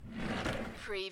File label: desk drawer open